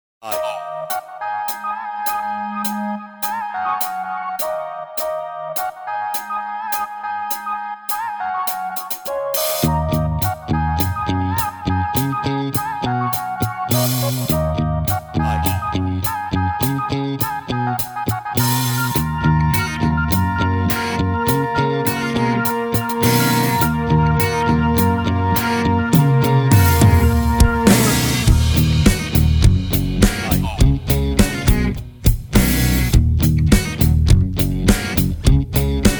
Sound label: The Whistler